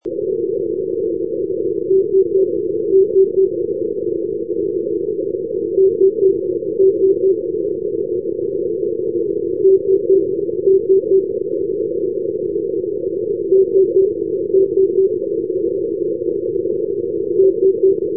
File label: ndb 390; skovde; europe